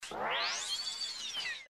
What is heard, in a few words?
Glitch; Pokemon; Ditto